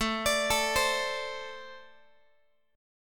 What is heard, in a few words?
A Minor Double Flat 5th, down, Guitar; arpeggiated; JGuitar